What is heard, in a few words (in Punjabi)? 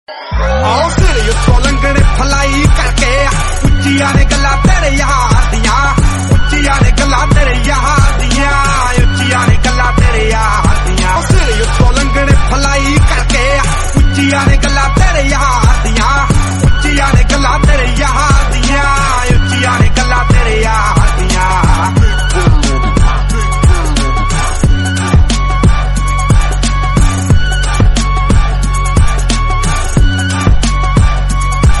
attitude punjabi